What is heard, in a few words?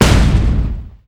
rifle; StarSiege